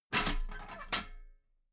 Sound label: metal ladder small handle unfold regular